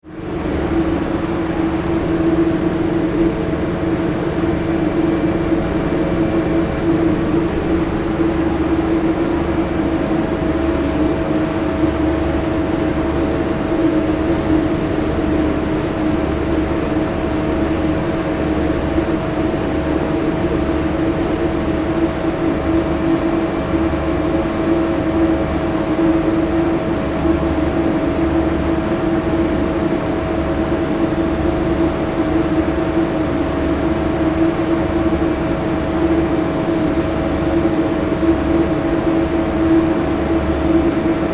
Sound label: Ship Large Car Ferry Engine Room; Tom Hutchings